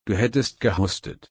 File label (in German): husten